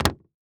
Foley Footstep Hard Sole Dress Shoe Jump On Solid Wood Platform; Foley Footsteps